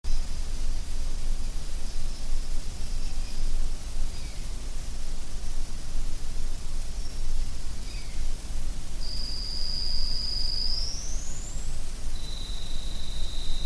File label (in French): bruit d'oiseau incessant répétitif strident; plein paris; chants